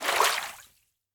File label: fish Splash; netent